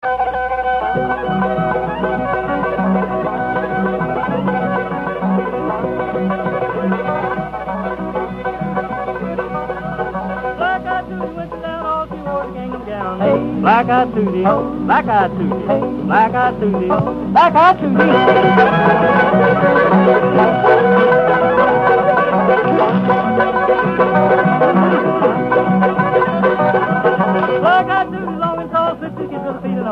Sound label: Black Eyed Susie, fiddle